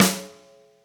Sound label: pista, snares real